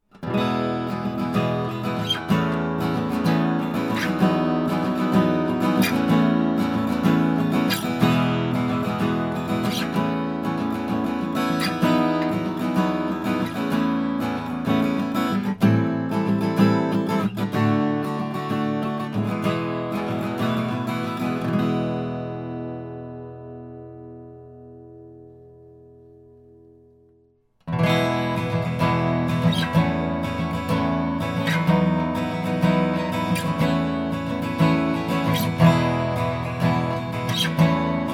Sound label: strum sample